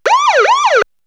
Siren; X-Static Goldmine

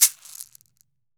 CAXY, CAXIXI; Brazil

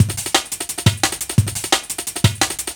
DNB BREAK

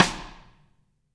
HALL RIM, HALL SNARE

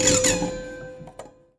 Prepared Piano; Battery 3 Sample Kits

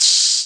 hat open high hiss station; Classic Chicago House; Drum kits

